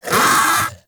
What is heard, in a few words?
Vocal Pain; Hunting Critters; Vulpaphyla